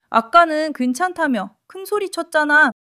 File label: laughter